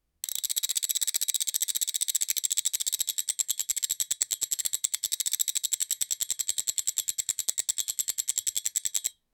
subtle fast mechanical, slot machine

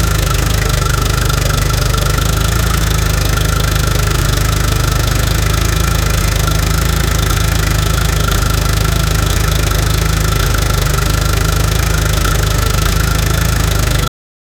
very big v12 petrol engin

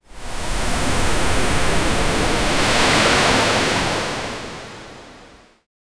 ocean

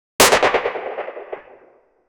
akm gun shot loud similar; SFX Engine

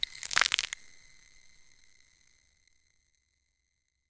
cracking frozen lake; ice

